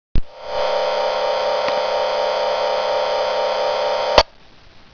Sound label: Tone Hum, Yaesu VX-7R